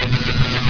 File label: veee